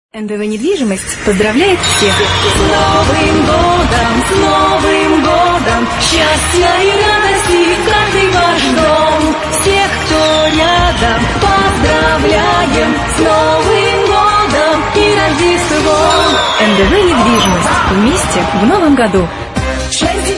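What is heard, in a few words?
Vocal demo; Vokal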